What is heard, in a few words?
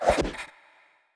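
Dagger Attack Right To Left; we are the dwarves gamerip 2016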